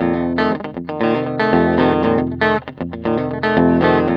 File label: TELE7TH F#2; Spectrasonics; Hans Zimmer Guitars VOL-1; TELE7THS